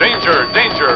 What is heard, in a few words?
danger, Cartoon Film Effects